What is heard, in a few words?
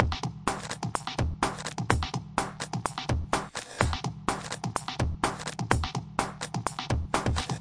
break and space, drum loops and breaks, 126 bpm